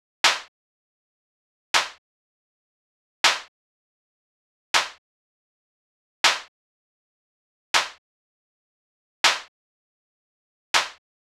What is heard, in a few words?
Snare, 080 Emin; Hip Hop; big fish audio; Hip Hop Exotica; Apple Loops; Drum Tracks